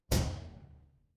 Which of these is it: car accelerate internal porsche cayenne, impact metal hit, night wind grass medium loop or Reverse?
impact metal hit